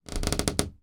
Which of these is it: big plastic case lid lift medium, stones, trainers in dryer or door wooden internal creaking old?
door wooden internal creaking old